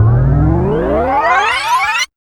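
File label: TPEWND, tape wind; X-Static Goldmine 1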